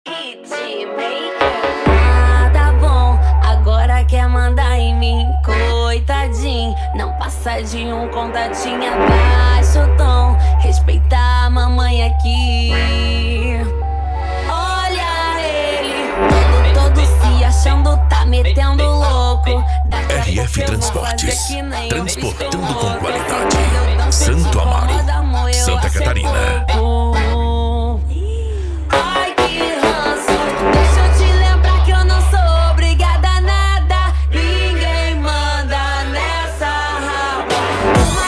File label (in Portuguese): especial funk, cd